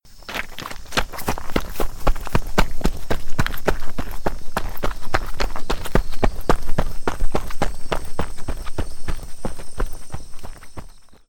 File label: running in fall forest; human